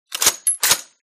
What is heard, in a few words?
mosin cycle, guns